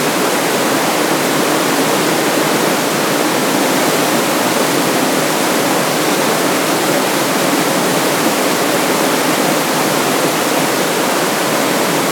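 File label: water fall med distant loop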